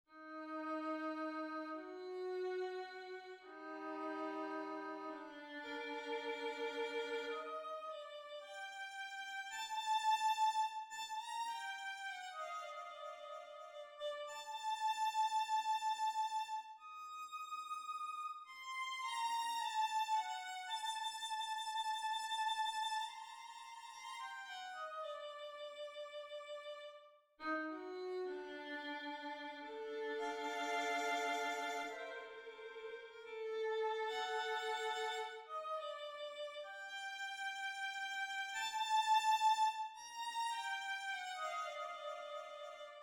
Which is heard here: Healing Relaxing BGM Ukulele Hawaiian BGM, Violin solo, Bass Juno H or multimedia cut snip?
Violin solo